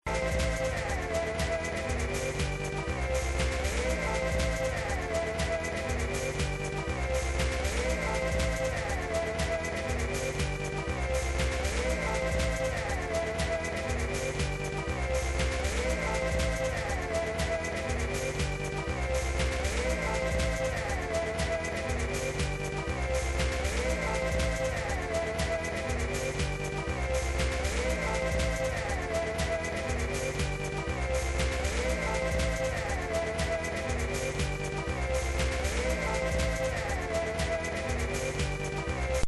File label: Kaossilator